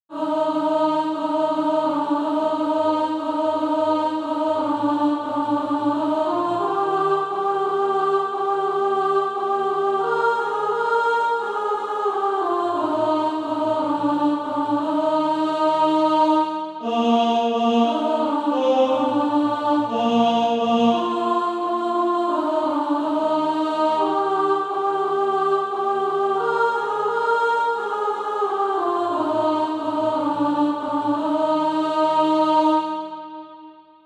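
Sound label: alto, SATB Practise Music; Draw Me to Thee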